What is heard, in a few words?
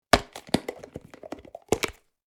Plastic food container open